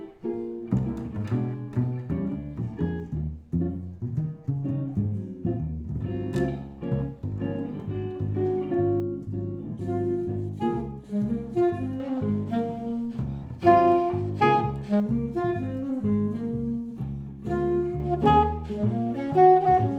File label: jazz minus x R High